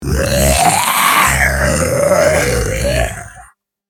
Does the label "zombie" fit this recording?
Yes